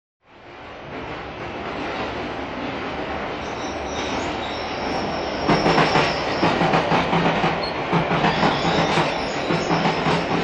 Train Medium Activity London Station Hammersmith Undergr; Hollywood Edge 3-D SFx